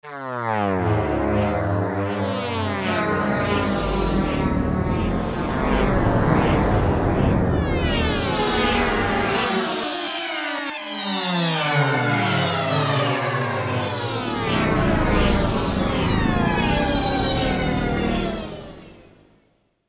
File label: M1 Racer, Korg MSC-1S; Korg MSC-1S Variety